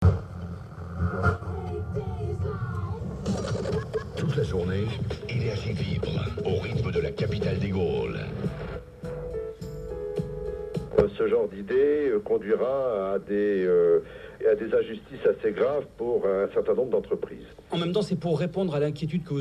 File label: radio